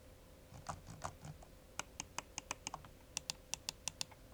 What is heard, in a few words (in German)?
Sound Scroll MM DPI